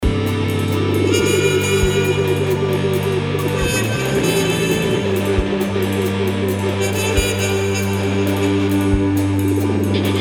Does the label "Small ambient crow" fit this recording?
No